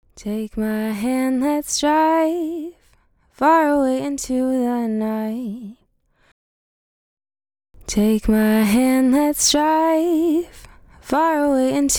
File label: TRANSFORMER VOCAL DRIVE; SATV Saturator